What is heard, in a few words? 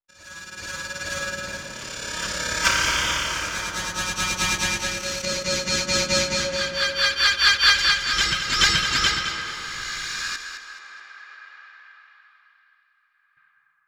FX Ambient